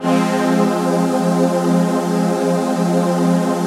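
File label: chord pad, Pads; Best Service; XX Large - Pads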